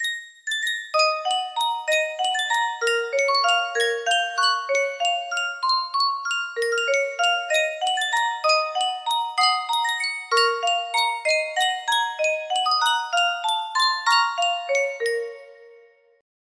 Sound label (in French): yunsheng boite a musique